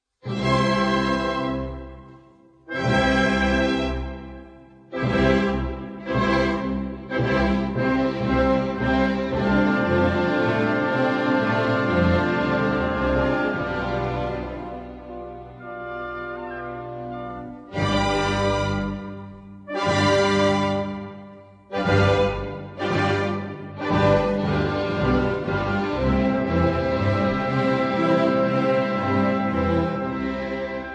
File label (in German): Klavierkonzert C-Dur Allegro maestoso